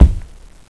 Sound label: player step